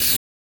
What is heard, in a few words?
carbonation malfunction; M8; percussion and foley